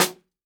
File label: jungle snare chromatic, real drums; ProSamples vol.43 - Real Drum Kits